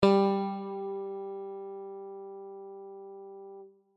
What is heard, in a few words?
guitar g